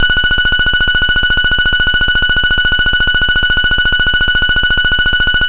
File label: tone